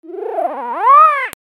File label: Call; Animal